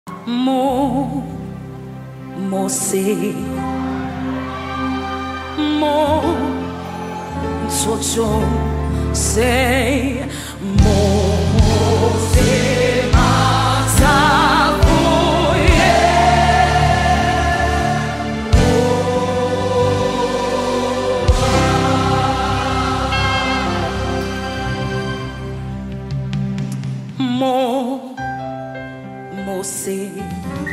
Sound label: Joyful Noise Medley